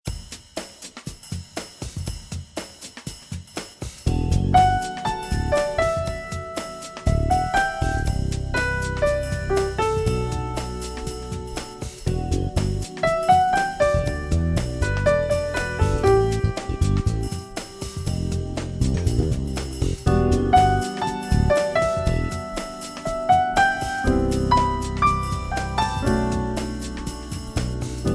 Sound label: light jazz